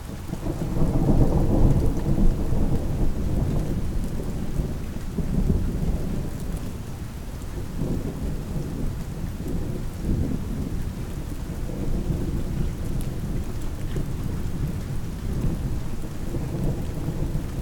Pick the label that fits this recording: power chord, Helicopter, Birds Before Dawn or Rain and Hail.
Rain and Hail